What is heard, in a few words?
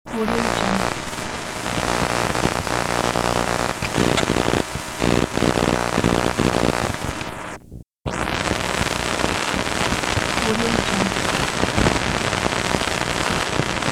static interference noise